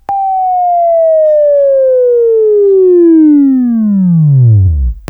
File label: WAV Right, Sweeping; Sweeping Training program